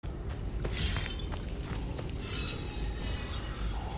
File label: ghosts; kena bridge of spirits